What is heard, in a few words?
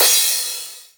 CRS, Korg Electribe EMX-1